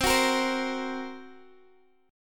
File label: Bb Suspended 2nd Sharp 5th